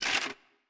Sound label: spin Button Click; netent